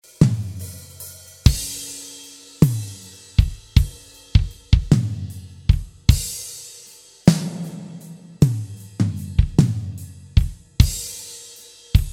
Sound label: DRUM THRESHOLD 0; compresor de audio de mi consola